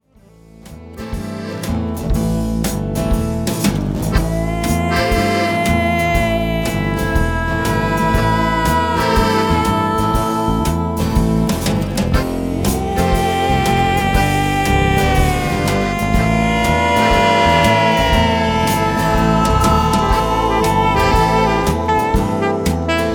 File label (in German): Klangreise; Trailer